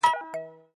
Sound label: end call; Dargalon